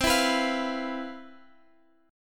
C Major 11th C, Guitar Open F; CM11 strummed; JGuitar

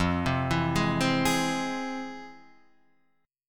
F Minor Major 7th, FmM7 chord; FmM7 arpeggiated; JGuitar

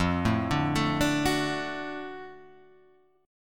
F sharp Minor Major 7th Sharp 5th

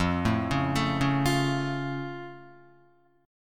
F Augmented Guitar; arpeggiated; down; 4F,4A,5C,5G,5C,6E

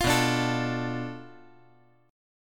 B Minor 7th Flat 5th B

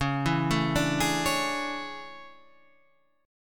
A Major 11th, guitar

